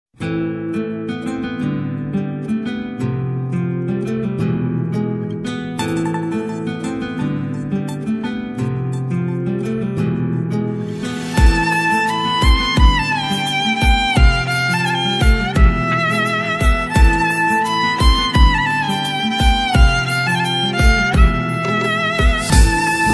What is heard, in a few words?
bangla ringtone